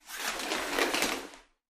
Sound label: shower curtain; curtains